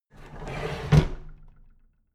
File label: drawer big clatter close heavy